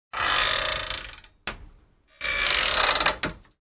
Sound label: wooden door creak